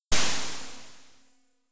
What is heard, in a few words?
lem drown; dos lem